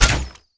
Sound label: fire, weapons